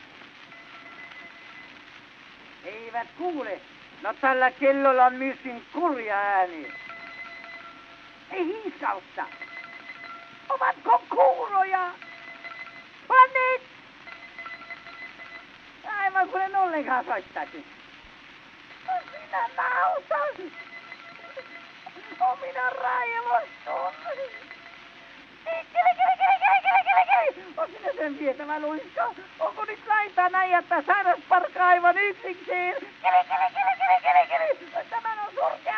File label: Gramophone